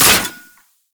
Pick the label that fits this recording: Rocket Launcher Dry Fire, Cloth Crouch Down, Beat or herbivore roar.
Rocket Launcher Dry Fire